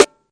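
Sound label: snare, Classic Hip Hop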